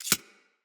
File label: Arachnoid Micro Step; Corpus